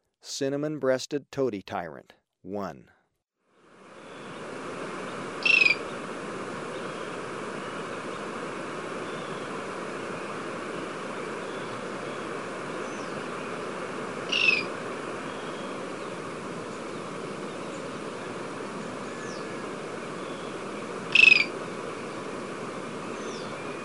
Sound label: Cinnamon-breasted Tody-Tyrant, Birds of Ecuador; S. America